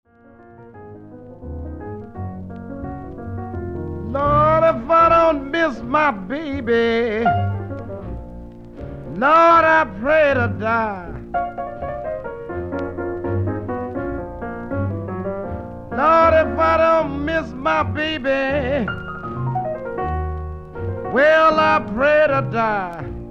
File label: vocal melody